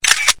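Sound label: shutter sound; ZTE R516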